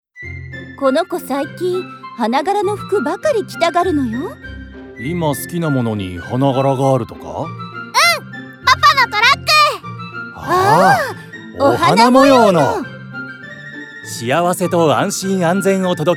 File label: boom